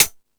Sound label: Thundadome, E-MU Pro-Cussion; drum machine